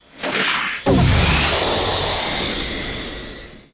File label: Pack Card Pop Legendary; Golf Blitz 1.12